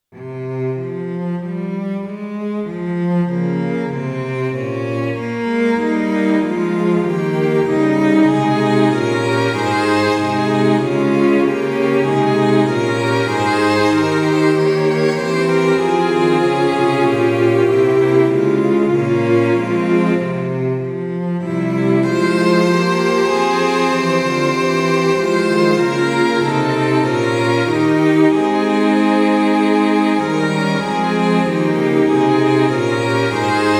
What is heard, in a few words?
Str, Tonality：f (♭♭♭♭) Tempo：Quarter note = 96; MIDI⇒MP3